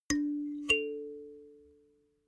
notification kalimba fifth up

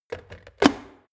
plastic water boiler lid on quick

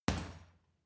boxing punching bag uppercut medium

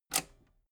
metal button robust spring push disable

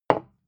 bottle put down hard

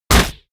crossbow shot

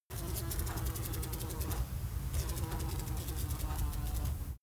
fly window

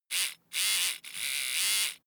servo sequence

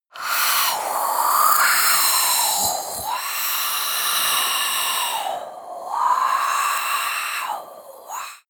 breath female eerie wind wah

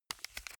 turning page

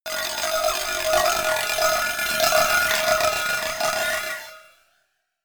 cowbell tremolo clank

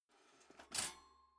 toaster soft eject

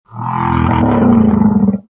big whale

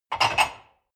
dishwasher cup grab

